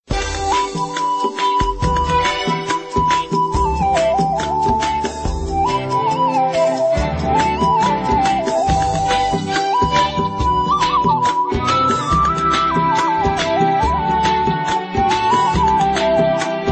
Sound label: Sudanese Music